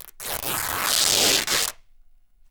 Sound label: RIP, Canvas Bag Rips